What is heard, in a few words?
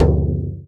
BASS, INDIAN PERC